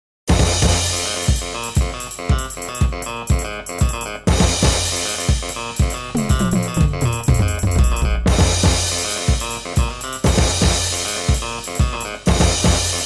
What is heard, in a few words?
Hot Brass; movie